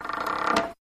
Gears Mesh Loosely

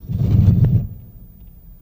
Quick Rock Slide, rocks & dirt

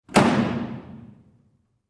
Wood Seat: Pushing Up, In Reverberant Hall.; seats & stools

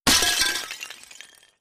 Ceramic Breaks; clay & ceramic

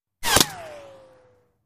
Bullet Impacts With Whir In, Impact And Debris

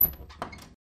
Door Open Squeak Lat, latches; latches & bolts

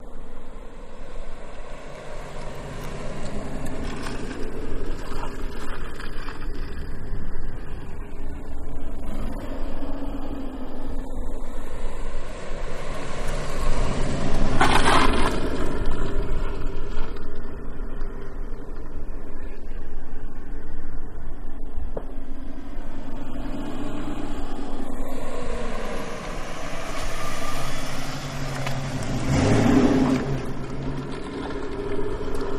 Mustang Drives In Circles, On, Off Highway Loop

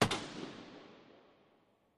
Hockey Puck Hits

